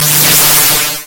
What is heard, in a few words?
death; ubertube